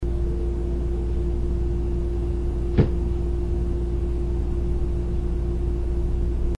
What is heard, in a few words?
loud knock